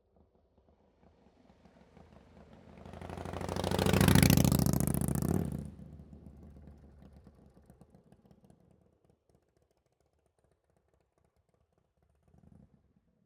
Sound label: motorcycle, FatBoy, straight pipes, tuned engine, pass by, fast, misshift; Mid Side Raw; Eiravaein Sound; Anarchy